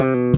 Slap Bass

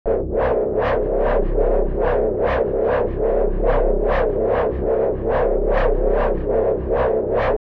Atmos FX, Atmosphere & FX; Loops; Touch Loops; BlissedOutBeats

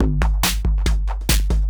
DRUMLOOP TEKNO 140; Techno2

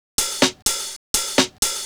HOUSE BEATS 125

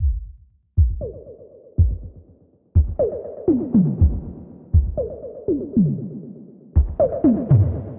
120 Beats, Drum Loops

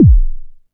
BD HUMP; drum; BASSDRUMS

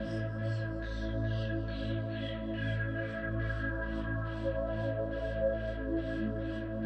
techno pad 140; Techno2; Keys

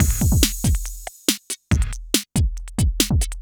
electro beats 140

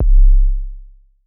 F#1 Pure Tuned Kick, Tuned Sub Kicks; One Shots; Touch Loops; ElementsOne; Abstract Rhythms

